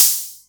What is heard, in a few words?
HatO, Roland MC09